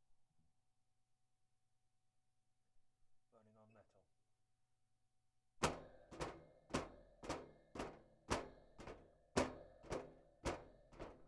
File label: Footsteps On Metal